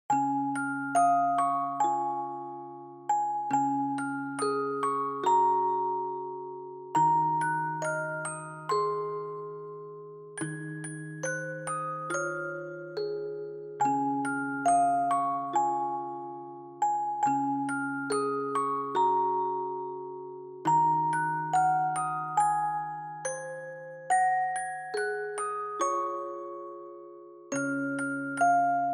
Reverb Simple Slow; bgm; Jingle Bells

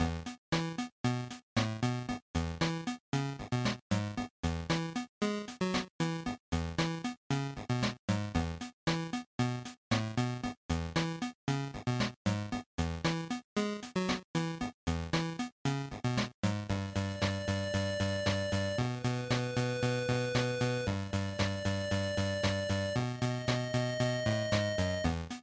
GB Fighting Story Loop115

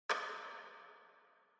Clock Minute Hand Reverb

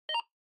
Bingo Machine Digital Select Speaker

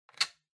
Wall Outlet Dry Unplug

